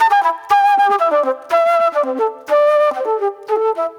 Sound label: Soul Plate Flute